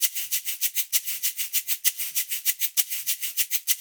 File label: LOOP, SHAKERS, Percussion; InVision Interactive; Percussion Slam